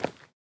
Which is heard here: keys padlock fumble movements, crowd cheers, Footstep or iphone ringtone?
Footstep